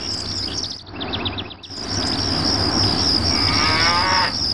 AMBIENT; CD Action; lomax

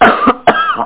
cough

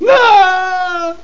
WEEAAAAO; MacAddict; Carmageddon Demo

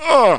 ouch; mordor

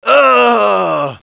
death; SINDEMO; civmale

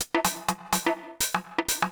PERCLOOP HOUSE 125; eJay

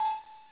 am fm beep; Jailbreak 1.01

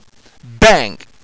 BENG

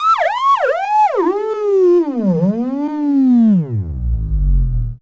siren down, alarm police; environment

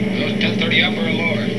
B17; Vehicles

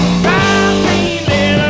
new mail notification

